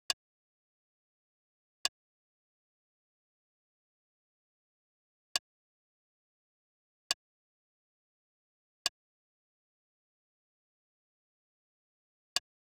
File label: Drum Loop 137 BPM Percussion; Loop Stems; Cymatics; Mystery Pack Vol 4; Cage Fight